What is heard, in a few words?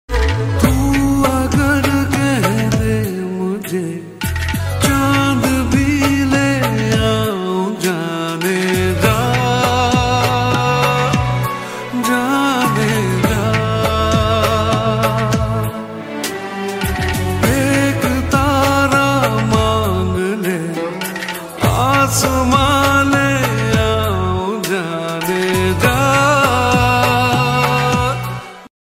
hindi ringtone